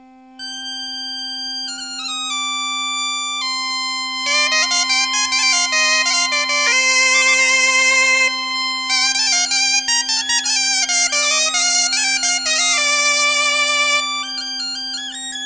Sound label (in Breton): Plinn Bal